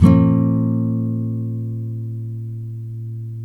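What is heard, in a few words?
NYLON B MINOR DOWN, CHORDS, Guitars; Spectrasonics; Hans Zimmer Guitars VOL-1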